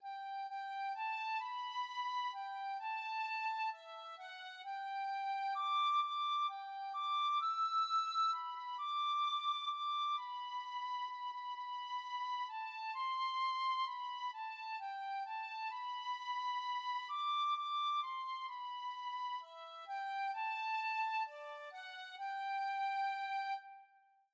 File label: the friendly beasts, tin whistle